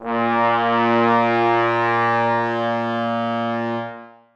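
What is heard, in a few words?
trombones sustain a#3; Sonatina Symphonic Orchestra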